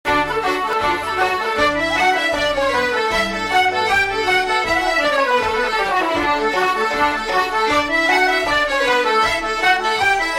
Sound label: Hornpipe, Irish; Cork Hornpipe; Finnegans Players List of Tunes